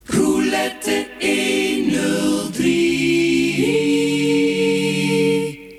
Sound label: silence